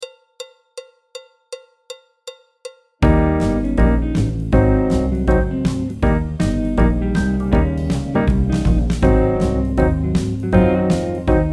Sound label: Up Front BT (trumpet); Jazz Syllabus Level 1